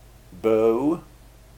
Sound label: bow